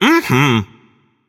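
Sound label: nonverbal agree; smite; voicepack; merlin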